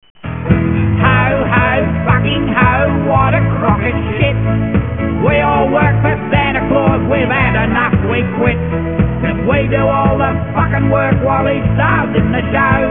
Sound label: ho ho